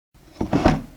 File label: wooden Crate Close